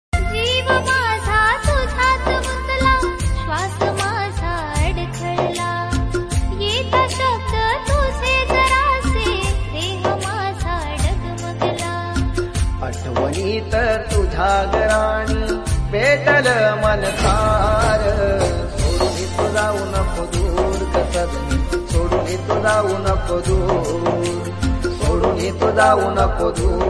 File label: Marathi Sad Song Ringtone